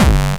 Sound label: bombo, kicks, Hardcore